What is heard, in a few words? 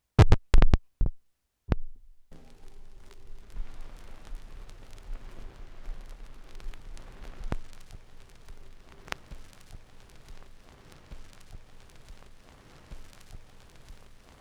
Needle Breaker, record fuzzies; Micro Pack